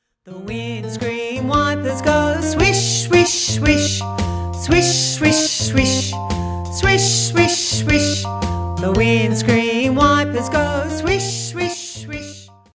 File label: bells Vocal; musical instruments for toddlers; Wheels on the Bus